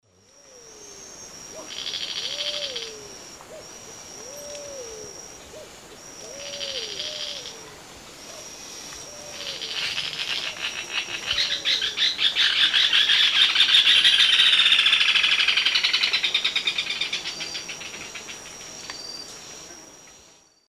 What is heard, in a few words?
Synallaxis propinqua, call and song; S. America; Eastern Lowlands